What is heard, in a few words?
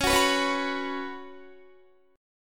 Db 7th Suspended 4th Db down Guitar; strummed